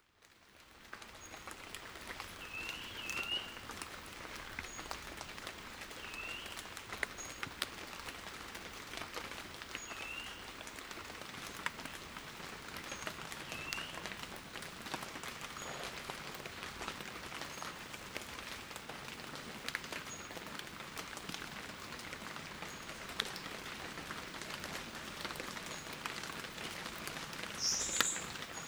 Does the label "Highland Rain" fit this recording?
Yes